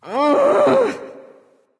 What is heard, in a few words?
"ugh"; smite; voicepack; nike